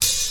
dark tone open hi hat sample f sharp key

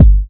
clear bass drum sound g key

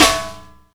snappy acoustic snare sound f sharp key

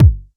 phat steel kick drum D sharp key

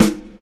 boomy acoustic snare sound g sharp key; snare drum samples

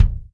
subby bass drum single shot c sharp key

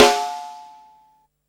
old school huge acoustic snare sound D key; snare drum samples

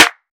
clean snare d sharp key; snare drum samples

